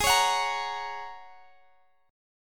Ab 9th Suspended 4th Ab down Guitar Standard; Ab9sus4 strummed; JGuitar

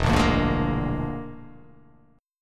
E 7th Flat 5th, E7b5 chord; strummed

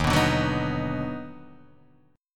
Eb Minor Major 7th Sharp 5th, Guitar Open D

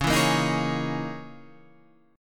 Db Minor 6th Add 9th Db down Guitar Standard; strummed; JGuitar

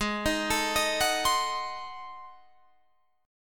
Ab 7th Flat 9th Guitar Drop D, chord

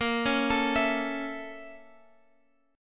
A sharp Minor Major 7th Flat 5th, Guitar Open G

